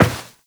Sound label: Step Metal, Footsteps, Walk; Warframe; Tenno